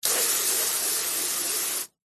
vehicles car water jet spray from hose on wing side mirror ext